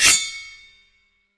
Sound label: metallic blade whoosh